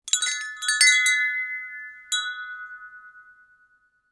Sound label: Wind chime short